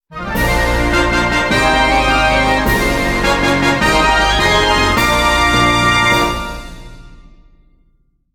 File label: bright jingle